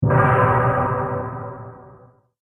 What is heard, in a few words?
Epic Impact; Miscellaneous Impact Sound Effects